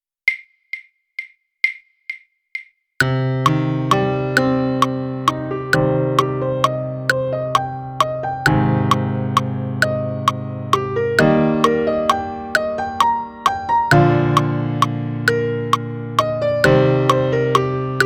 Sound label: ballad piano click; balada